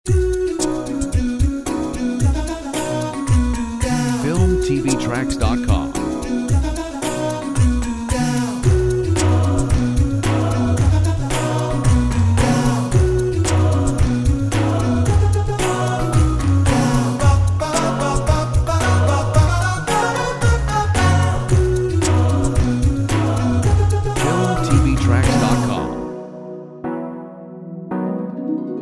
acappella

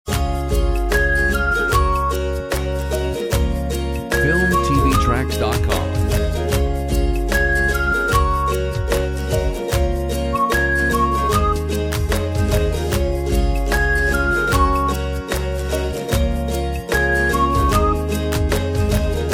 Summer Ukulele

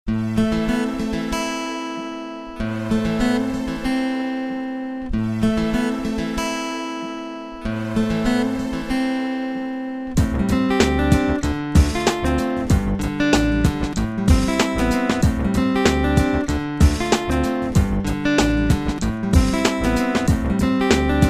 Acoustic Soul

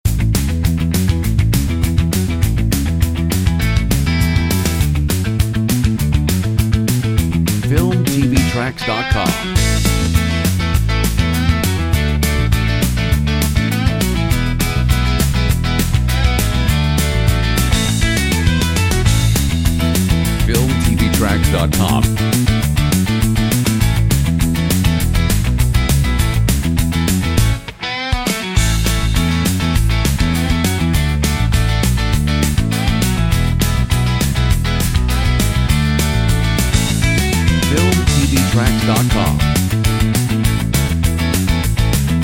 POP ROCK PARTY; comedy funny

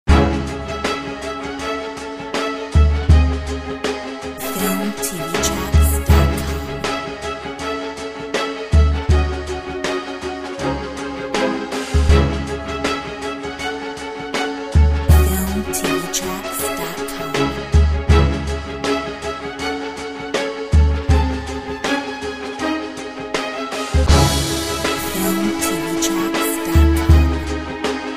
Classical Era

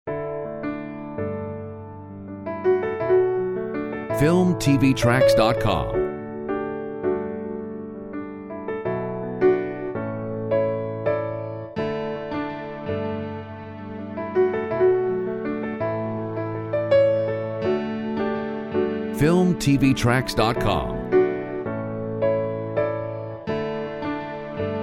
Beautiful Land

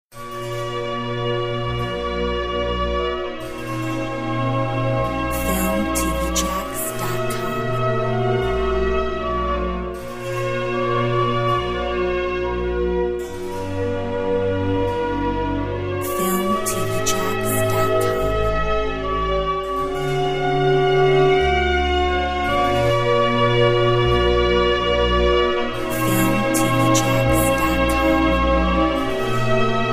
baroque

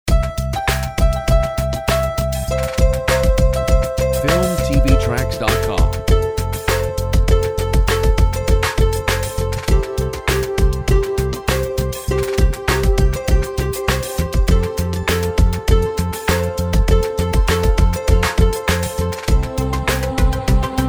Migrating Birds

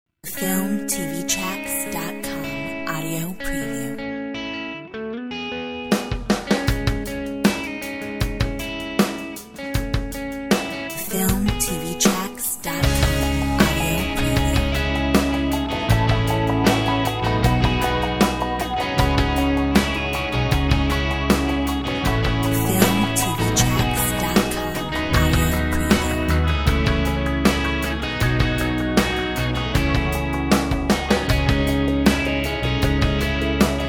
Rock

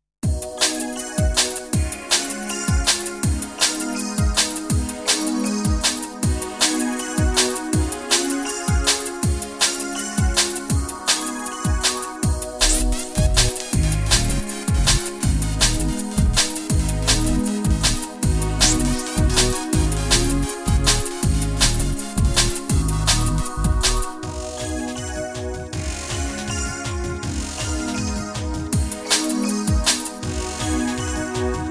High tech

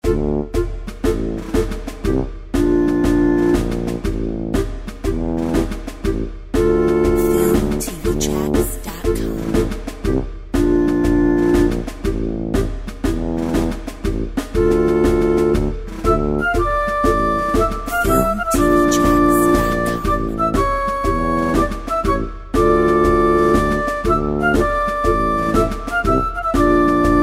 Blues March

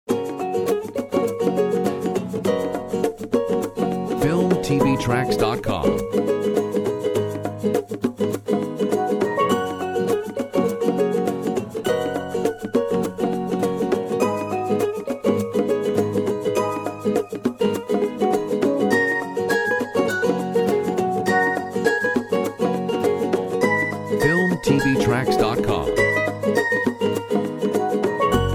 Ukulele

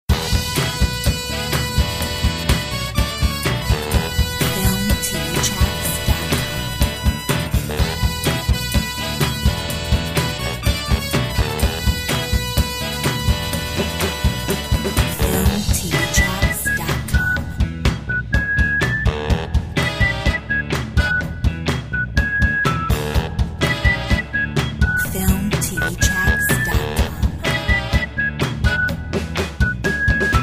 Whistle Mix

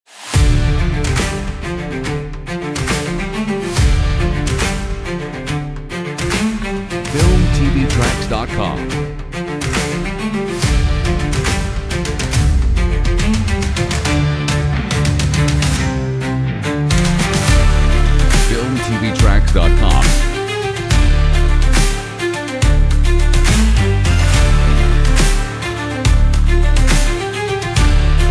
SPORT EPIC TRAILER

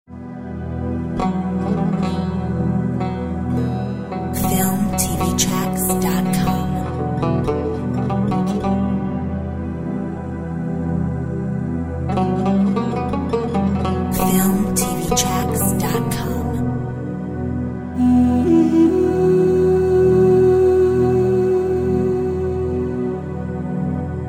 Desert Journey vocal

